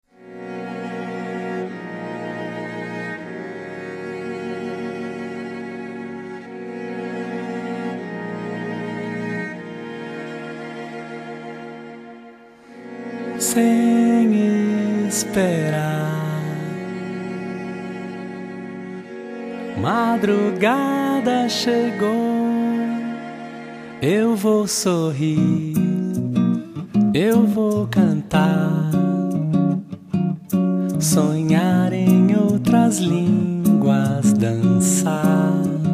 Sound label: Brazilian Cafe